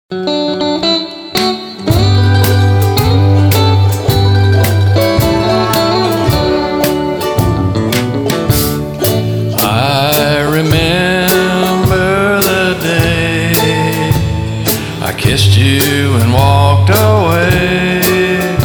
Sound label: Lonesome Whistle